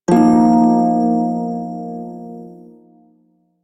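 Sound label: Prime Shawzin Pentatonic Minor Chord C; Music; Warframe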